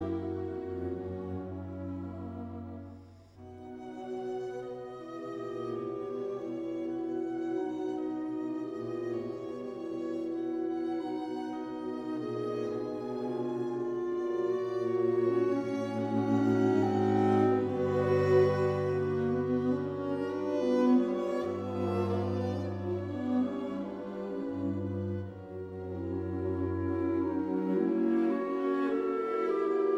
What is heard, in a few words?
Clarinet Quintet Adagio; Op 115